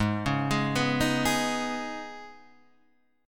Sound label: D sharp 7th Suspended 4th Sharp 5th G sharp, Guitar